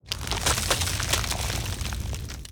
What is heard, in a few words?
Ice Wall; Spells; Free Fantasy SFX Pack By TomMusic